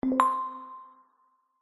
multimedia game sound chime mallet warm family game